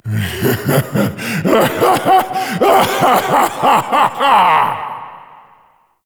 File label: Laugh; Vagabond; Warframe; Duviri; Easter Eggs